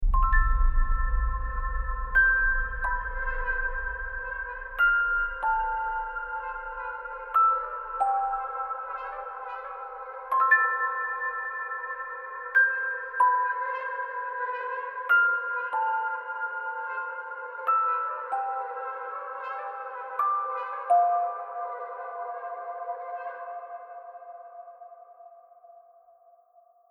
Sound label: Lullaby